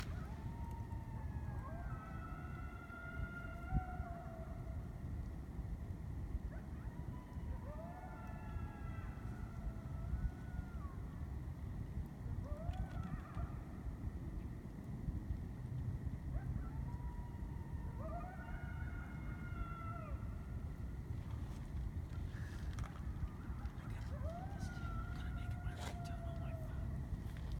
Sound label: Coyote Sounds